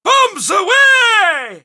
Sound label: quake; awesomenauts